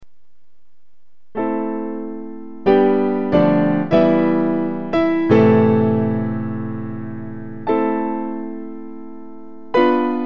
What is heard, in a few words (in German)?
orientakkorde